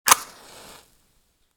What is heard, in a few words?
Matches lighting with fire burning striking